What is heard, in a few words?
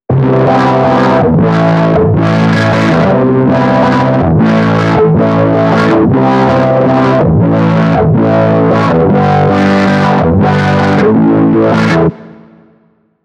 Chords Dirty